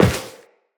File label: Step Metal, Footsteps, Walk; Characters; Tenno